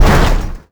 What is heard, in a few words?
touch, damage; DCS